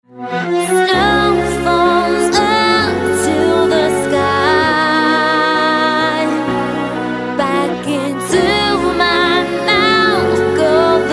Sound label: Stringapella